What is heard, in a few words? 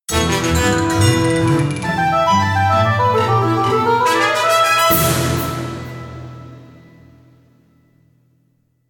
Reward; Plants vs. Zombies 2; Steam Ages